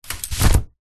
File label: foley umbrella open; zapsplat